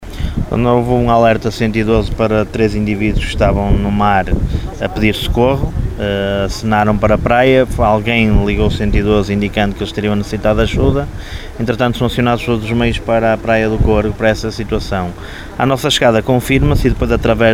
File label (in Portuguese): PRAIA CORGO FALSO ALARME